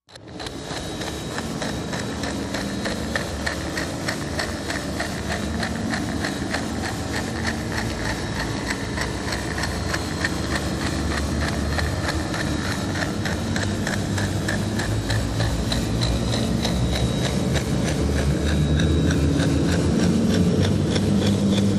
Helpless Clock, Machine, Pulsing Clock like, Electric, Distant Factory Drone